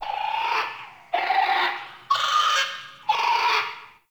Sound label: Forest Animal Mid; Ambience